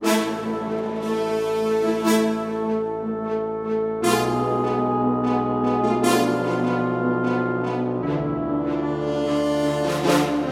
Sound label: Music Song Of Fear Brass; 7 Mages